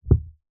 wood small heavy dense piece drop light carpet thud; foley